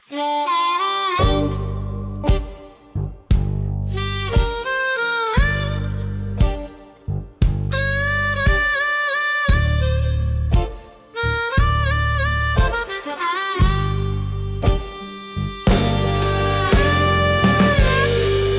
ukrainian beat

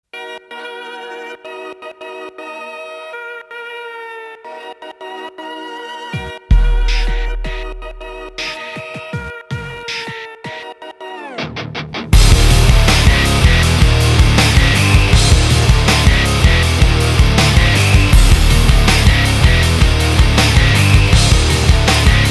instrumental

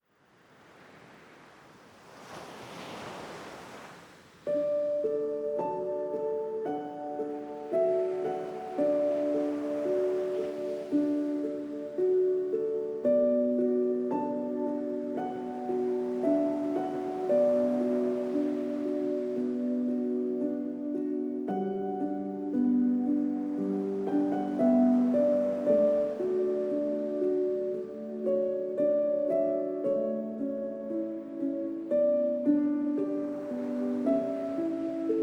piano relaxium evening ocean piano

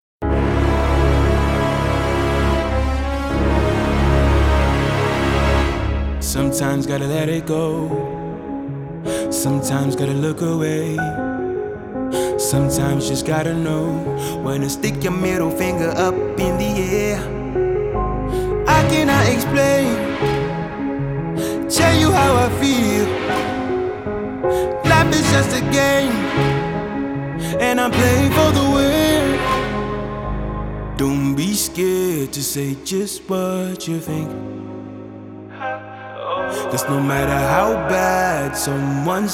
orchestral version

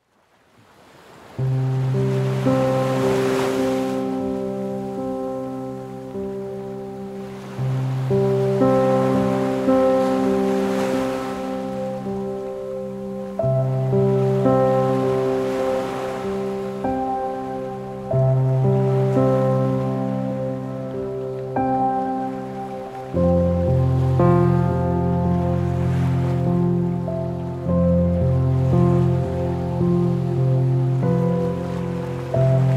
piano

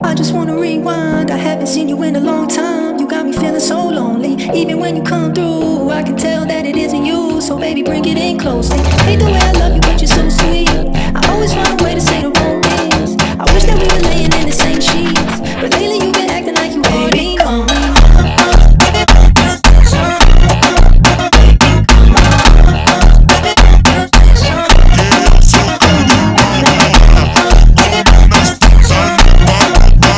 funk slowed

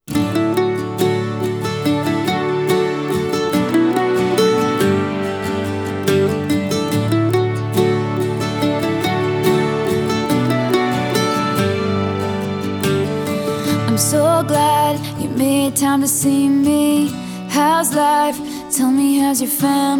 acoustic version

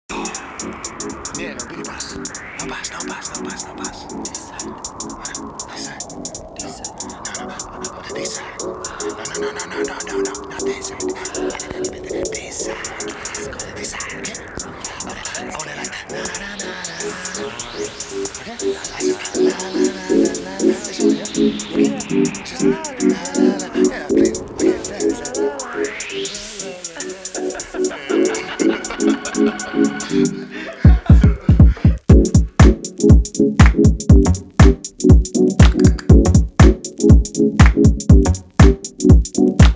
remix

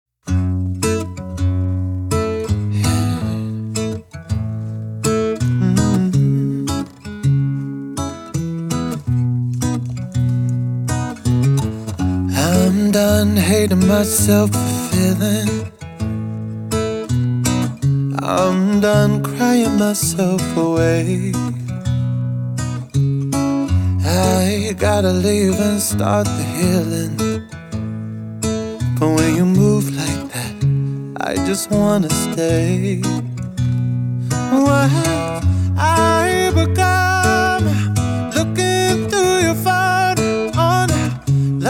acoustic

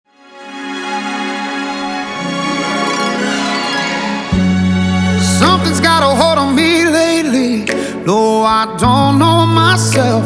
strings version

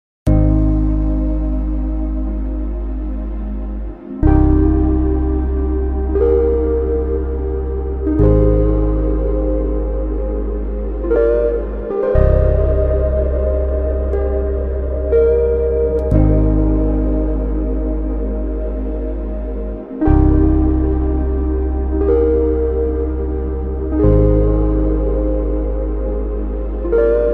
instrumental slowed reverb

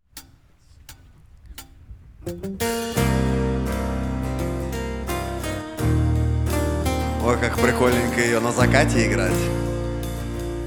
acoustic live

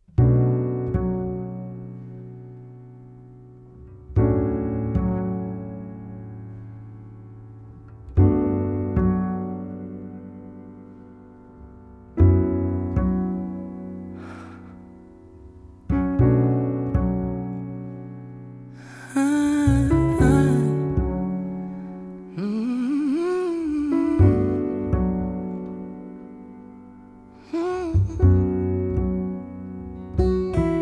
acoustic version

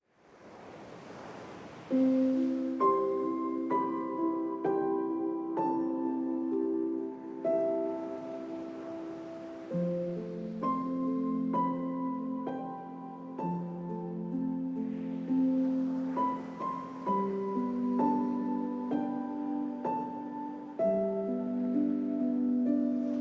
piano, ocean memories

